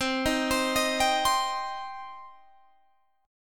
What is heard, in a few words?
C Minor C down Guitar Open G